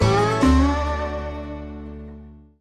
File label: Quest Complete; The Oregon Trail American Settler